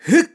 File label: Sword Charge; Link charging energy into his sword; zelda sounds; Skyward Sword